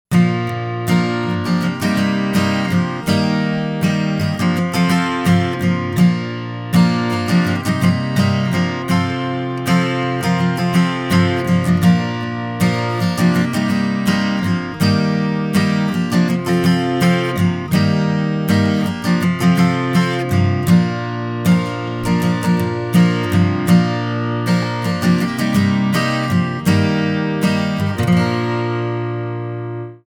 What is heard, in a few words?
country morning; Uj szignal zenek Várad